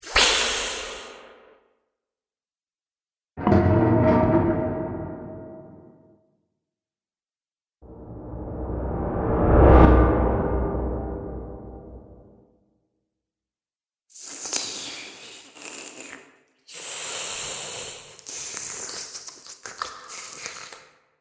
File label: Horror Vampires; Horror Pack - Vampires; Monster Sound Effects; Andrew Fleming